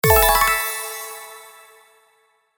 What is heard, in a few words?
open mystery box